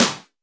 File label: snare a sharp key; snare drum samples